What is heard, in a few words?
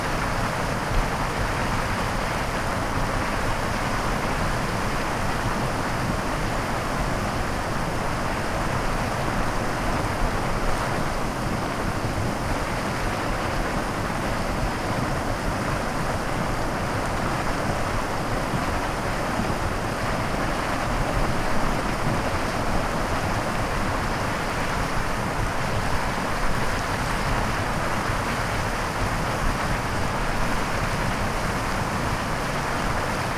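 Water Bubble Water Boil; water sounds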